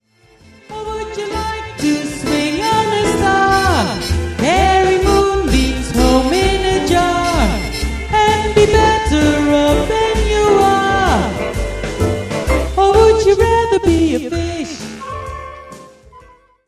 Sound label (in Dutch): zang